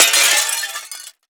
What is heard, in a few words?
glass impact break falling debris; Bluezone Corporation